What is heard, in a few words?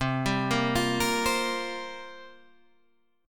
C 5th 11th C